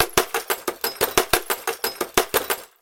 smash glass; anxiety; NotDotTeam

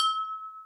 ding; NotDotTeam

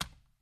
type; NotDotTeam

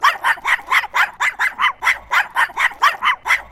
yaps; anxiety; NotDotTeam

